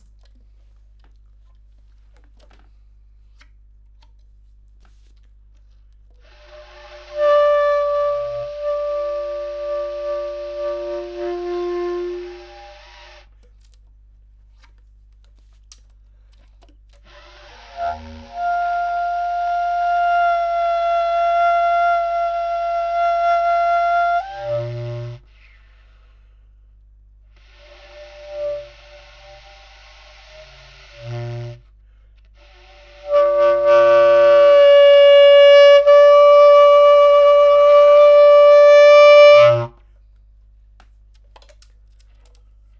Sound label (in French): Basse Clarinette